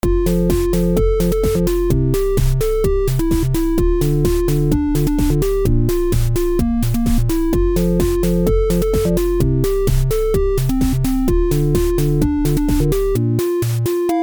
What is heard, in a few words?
chip bgm; Fumofumotris